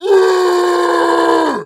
Male Rage Scream; Lucius II The Prophecy

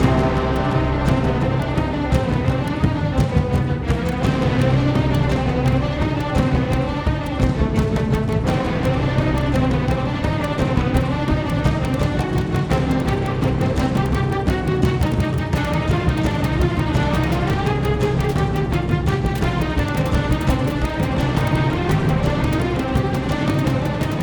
String; Assassin's Creed Unity Dead Kings

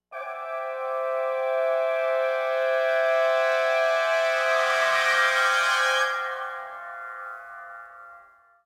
FLOURISH; bolt

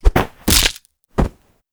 Kill Neck; Among Us

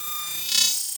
Glitchedtones Transition; Afterbern Democralypse; Windows

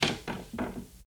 run wood creaky

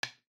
Piece Touched; this is the police pc

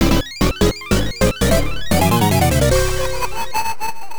Warp; time cruise; turbografx 16

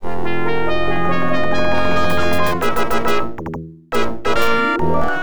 Imperial Capital Ship Destroyed; star wars x wing; ibm pc at

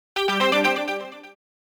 Coin Insertion; change air blade; arcade

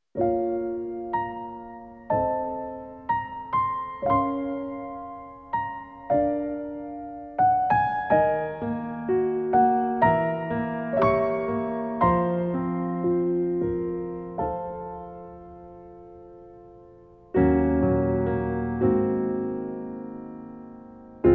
Introduction -Piano Instrumental-; Lord of Apocalypse Original Soundtrack

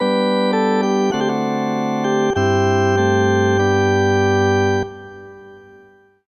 Save Fanfare; Wii; Fortune Street

